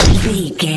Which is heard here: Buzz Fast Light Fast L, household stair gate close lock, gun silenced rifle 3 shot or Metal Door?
gun silenced rifle 3 shot